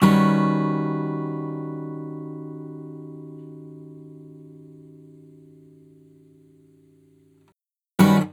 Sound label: Jazzy, Guitars; Total Drum&Bass